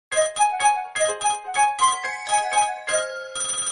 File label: nokia 3120